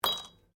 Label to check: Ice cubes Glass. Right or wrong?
Right